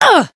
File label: attack; hiina